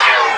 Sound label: Minimize and Restore Down